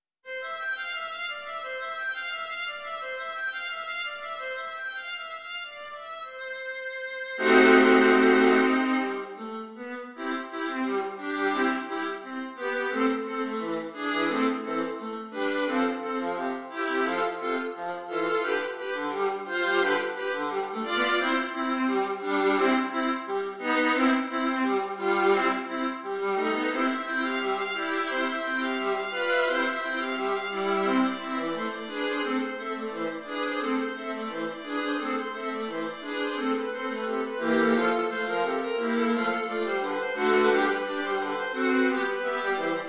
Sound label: for viola quartet; Kreutzer; Number Two